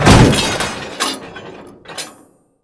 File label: car land